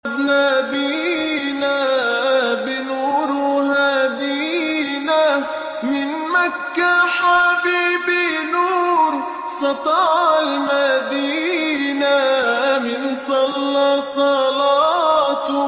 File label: viral new islamic ringtone